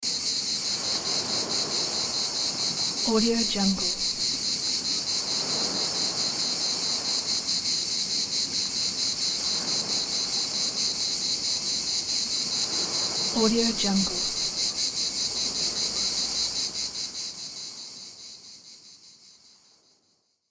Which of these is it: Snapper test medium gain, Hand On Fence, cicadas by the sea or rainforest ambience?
cicadas by the sea